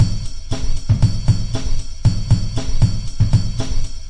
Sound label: 117 kick the focus groove, DRUM LOOPS and BREAKS